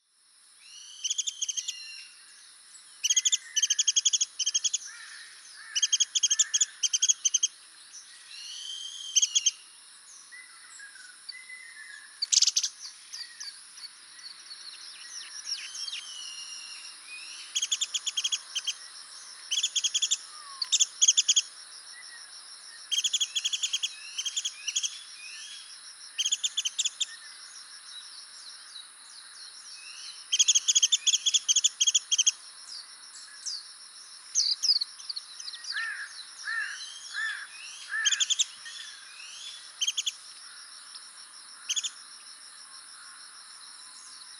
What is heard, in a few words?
call Warbling White-eye Zosterops japonicus; メジロ